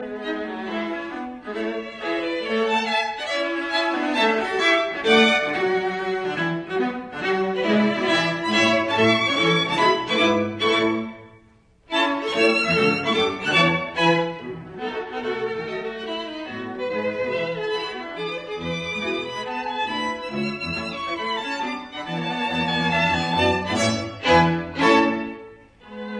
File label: String Quartet in A minor, Alla marcia assai vivace; Beethoven; No 15; Op 132; Piu allegro